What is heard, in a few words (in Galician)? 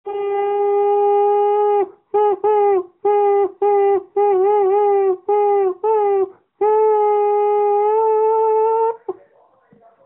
buguina